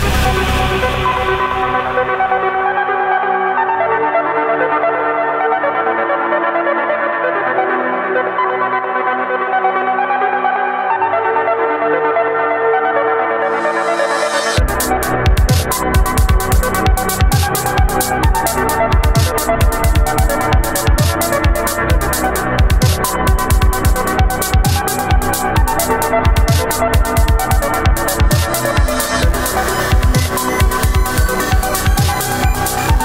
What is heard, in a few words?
music robot; trespasser v2